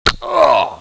fall pain

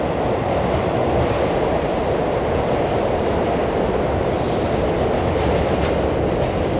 subway

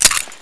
ak74 clip out

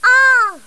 ooo

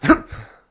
Swing

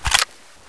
draw mp5

